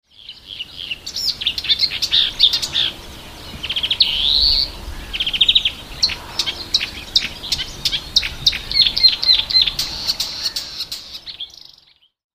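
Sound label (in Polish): lozowka, pierwszy przykład naśladownictwa. Na nagraniu możemy usłyszeć dość dobrze udawany szczebiot i gwizd dzwońca; wroblowe; zapozyczenia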